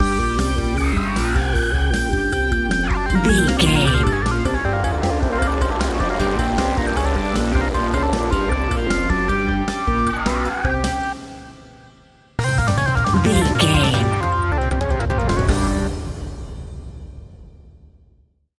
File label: video game boss battle bumper